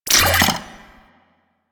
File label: Droid Array Equip, weapons; Warframe; Cephalon